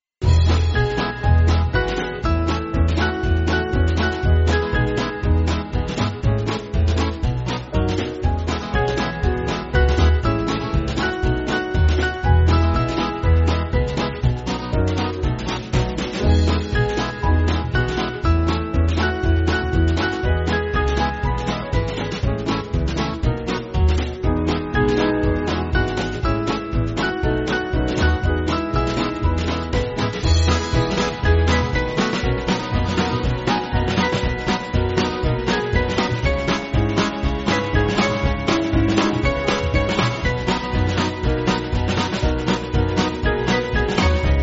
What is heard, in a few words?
Band